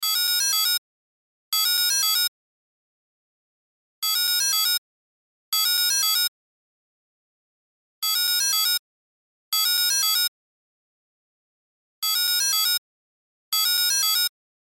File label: Cell Ringtone; Loop; Cell Phones; Blastwave